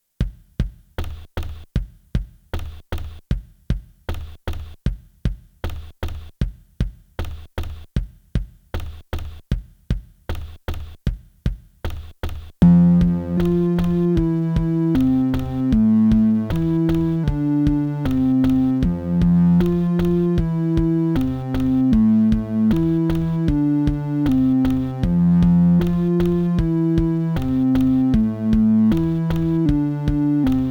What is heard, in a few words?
electronic, ambience; budapest